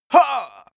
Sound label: male attack, schreie